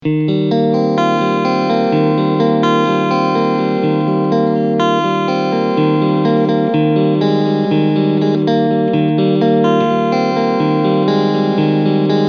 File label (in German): Cleansound Pos. 2